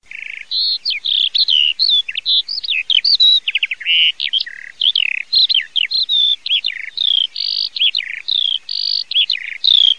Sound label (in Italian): ALLODOLA SUPER